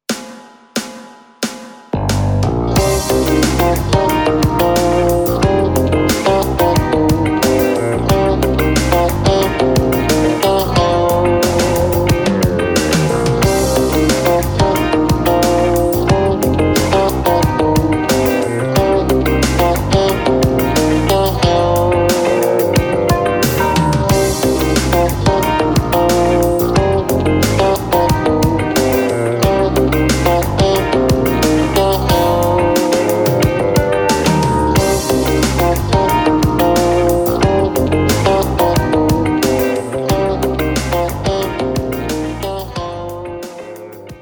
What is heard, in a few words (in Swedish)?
Bassfinger